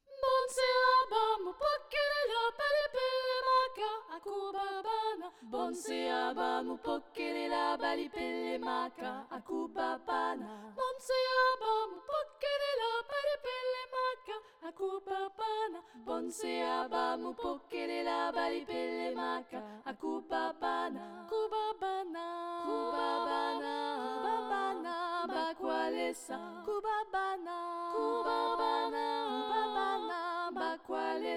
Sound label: tutti; Bonse aba